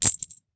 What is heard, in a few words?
item buy; action RPG game; Adventures in Lestoria; Sig Productions